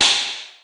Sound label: toggle on